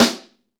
SNR, Snare; Club-50 - Foundations Roland; Ext.Snare 2

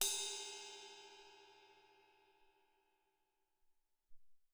Amb Rid PP, Alesis SR-18

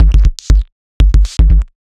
Clickers, 120 BPM, Percussion; Sonic Foundry (Sony Creative Software); Underground UK House & Electro

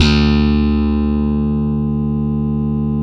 POP BASS, Electric Basses

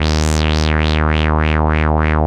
WAH WAH D#2; CMP - Analogon

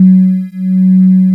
PAD; Sound & Vision; Gigapack I CD 2; PAD SYN-PADs; PAD Micro-Pads

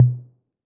808 TM, Roland MV-8008; Roland MV8800

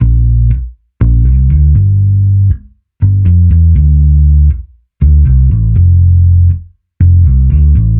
Bass Guitar 120BPM F; 99Sounds x Monosounds; Instrument Loops

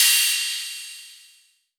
Rides, Cymbals

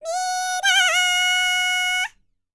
BULGARIAN, World Voices, BALKAN

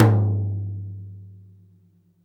TOM, Drum Dry; East Collexion; Drum 1

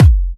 Kick; M8; Electronisounds

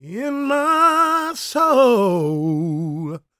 gospel male, vocal; ILIO; Vocal Planet

